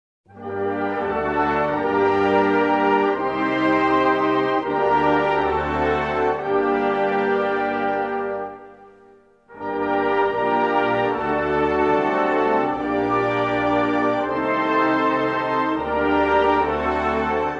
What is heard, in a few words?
Chorale; St Wenceslas